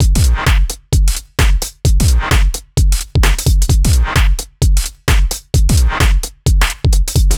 Solid Loop 130, Loungin House; Equipped Music

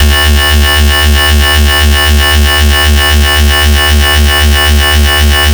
FS (D2), Synth; neuro; Stanza; FightSynth Multi

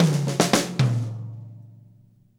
Fills, Monster Beats; Zero-G

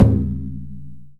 DAIKOKU, JAPANESE DRUM; AKAI S6000 CD-ROM - Volume 1; PERCUSSION

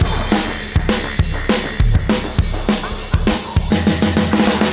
fx, Beat Loops, 203; Zero-G - Monster Beats

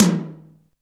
R8 Tom, Roland R-8

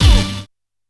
D#5 Uh! Hit, Yamaha PSR-E323 Sound Effect Kit

